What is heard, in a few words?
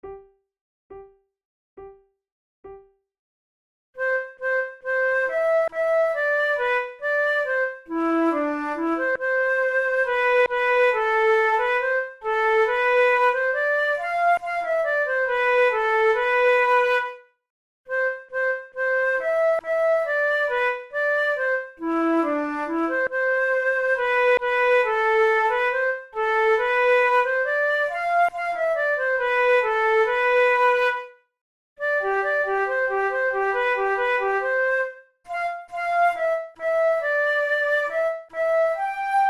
duet, flute